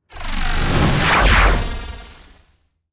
Electrical Surge End; starbites

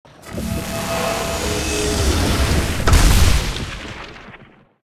Subdue; starbites

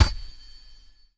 Parry Prepare; gamerip; Hornet

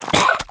Croaker damaged

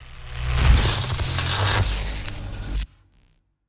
Elec Burst; Aerobot

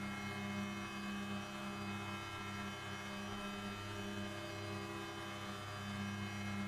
Battery Indicator Machine On Hum Loop; Sx Battery Indicator-Machine On-Hum Loop

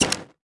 ui academy button close

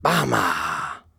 Bow; Sly Bow

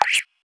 Jump

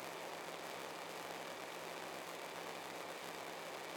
Battery Indicator Machine Broken Loop

